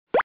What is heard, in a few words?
button